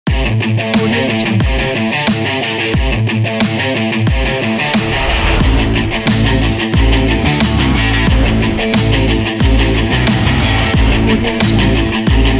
racing sports

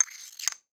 mortar and pestle grind; prosoundfx

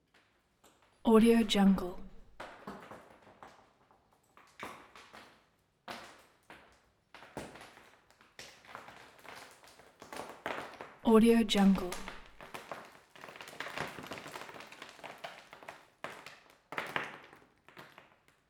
plaster wall aproaching debris fall

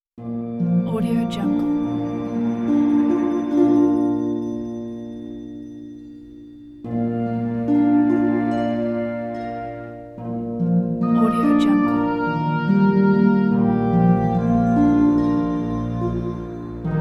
epic lyrical english horn theme

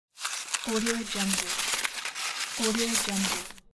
crumpling paper

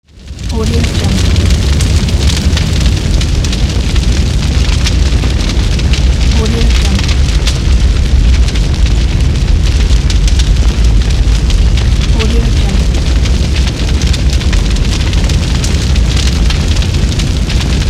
big fire

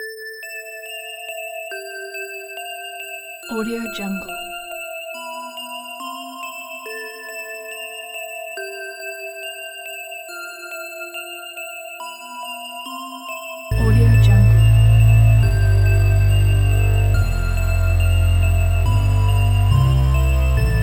sad tranquility

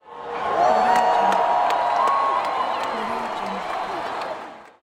crowd cheering

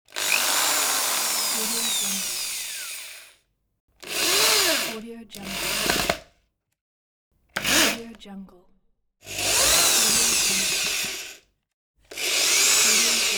power drill

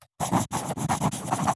handwriting fountain pen

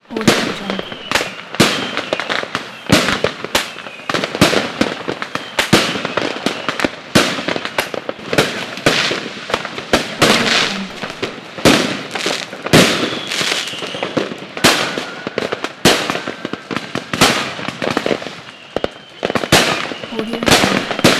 the firework; fx-market

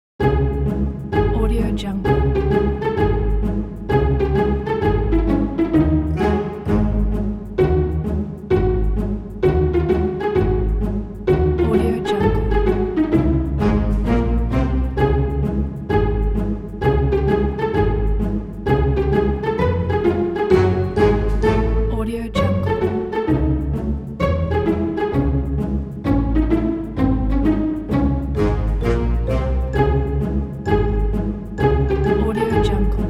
cartoon background

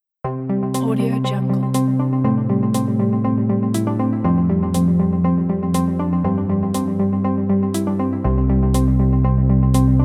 be successful

